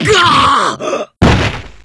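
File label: super damage, Japanese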